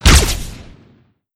fire, weapons; ppistol

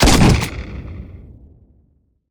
fire, weapons

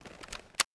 draw; halo2

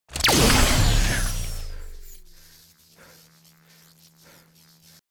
hit, sword; bane

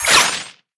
railgun; gtav; weapons

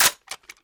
rifle clip locked; rifle desert, gunother; weapons

